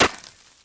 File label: ice light collision